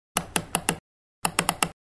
klik myshi zvuk

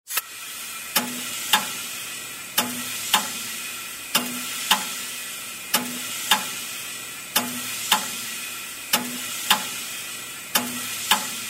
zvuki shinomontazha

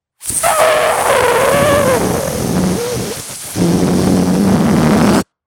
zvuk sduvajushhegosja sharika

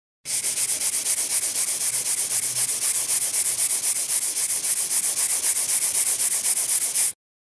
zvuk gubki

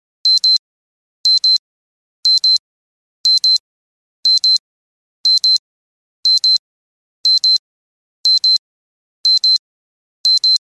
zvuk budilnika

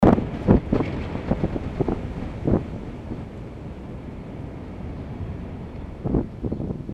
zvuk vetra

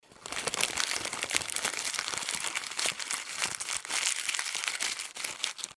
zvuk shelest bumagi

